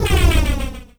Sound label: Noiz; Sonic Foundry (Sony Creative Software); Esoterik Beatz; Warsaw FX